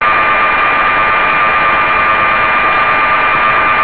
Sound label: tone